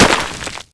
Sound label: hit dirt